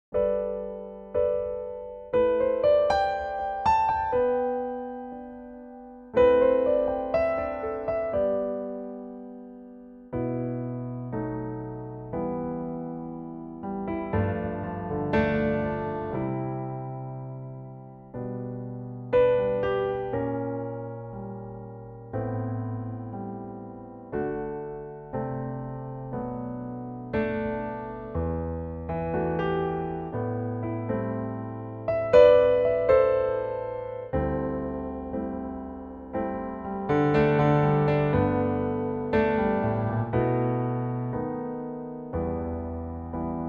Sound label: G piano